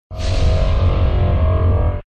Ship Weapon Beam Graviton

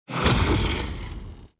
Ship Weapon Project Strike; In Game Sounds Original

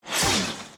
Ship Weapon Railgun; In Game Sounds Original

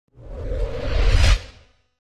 Ship Weapon Spinal Beam Fusion Charge

